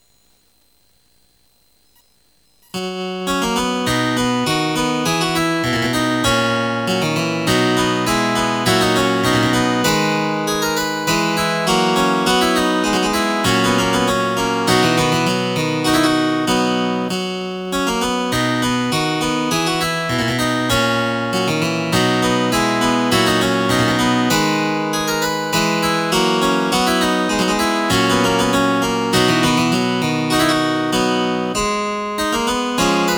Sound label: menuet